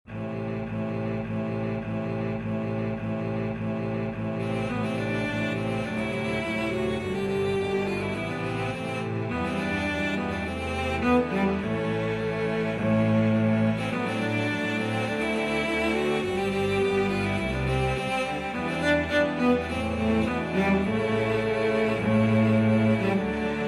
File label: cello ensemble; romanian folkdance; bartok